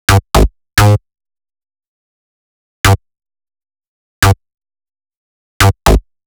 Stab DnB Retro

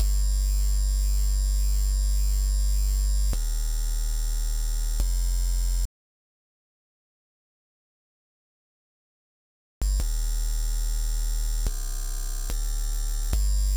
Dubstep Bass Gm

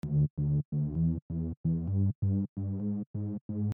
BASS; Omega Side

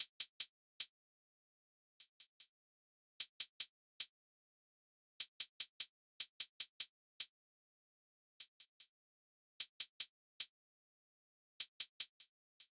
150bpm Closed Hat; Straplanta

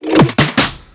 drum fill 150

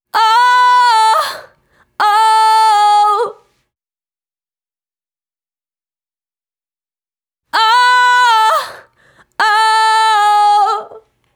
vocal oh oh whoa 130 Emaj

